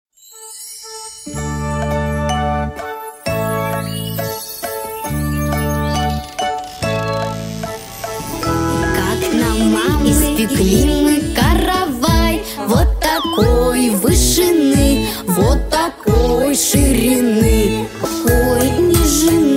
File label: instrumental